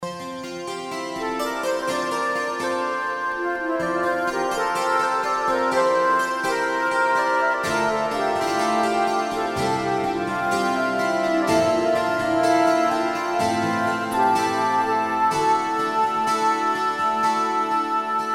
Motif Charpsi

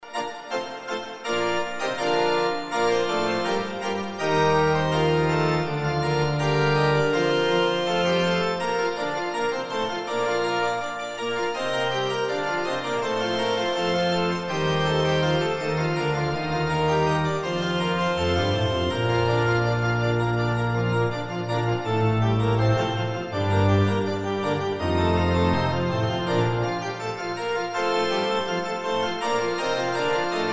Organ Improvisation

